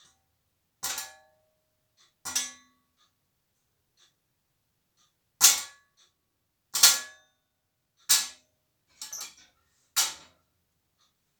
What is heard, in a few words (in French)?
metal clacs secs; Bruitages